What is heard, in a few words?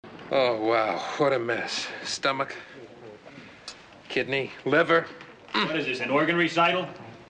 organ recital